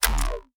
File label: ship set cancel; soundmorph